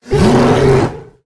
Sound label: Jungle Moonkin Attack